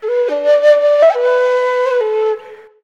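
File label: whistle A major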